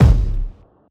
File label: warm kick single shot F sharp key; kick drum samples